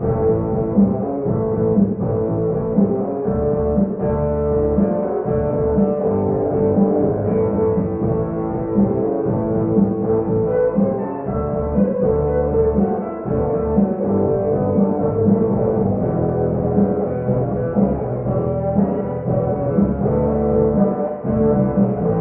Music Interior Rock

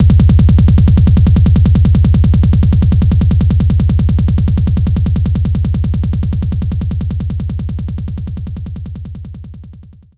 kick

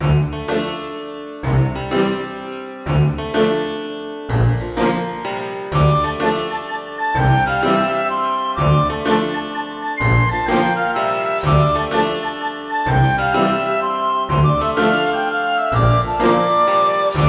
The Village - Loop; atmasphere windows gamerip 2018

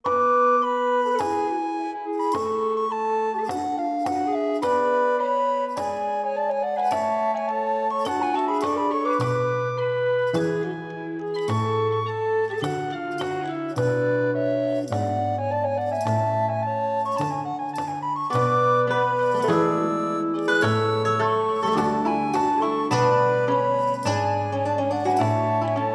Explore Music Loop; fat princess adventures; ps4

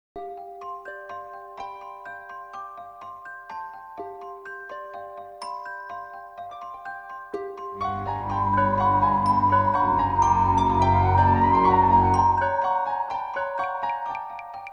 Cave

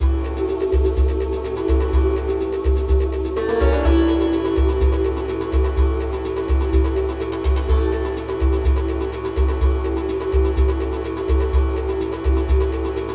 battle sitar; Shin Megami Tensei Imagine gamerip

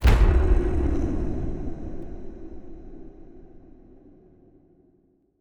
Stingers sting monster

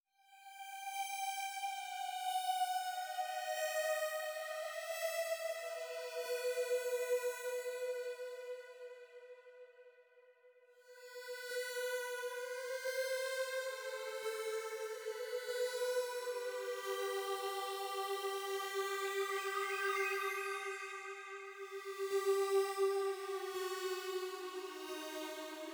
clear melody; one dog story

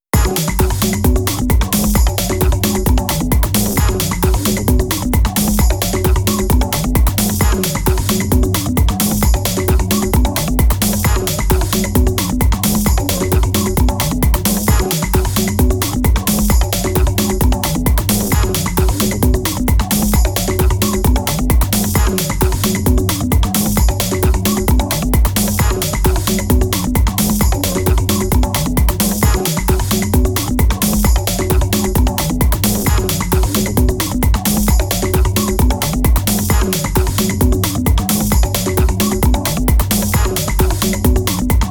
SYNTHESIZER

